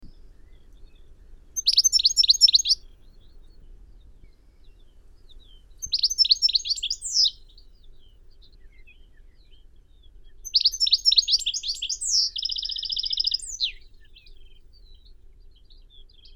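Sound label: goldfinch, Carduelis carduelis; shegol; zvuki prirodi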